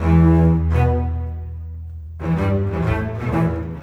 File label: Bass Cello; Sonic Foundry (Sony Creative Software); Orchestral Series VOL-4; Rock and Pop; Rock-Pop 19